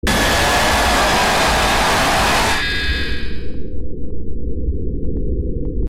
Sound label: restaurant jumpscare sound; blackben; FNAF